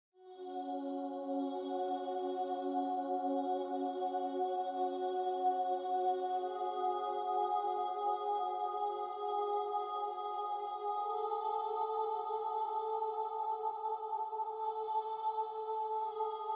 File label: Voices and Synths